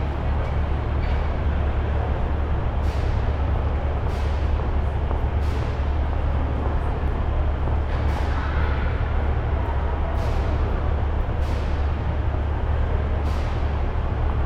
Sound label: Urban train station babble footsteps locomotive; urban and suburban; boom library